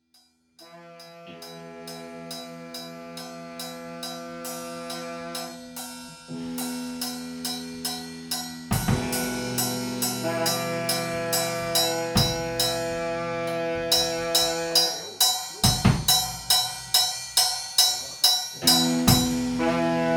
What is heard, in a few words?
Pratique toms sax tuning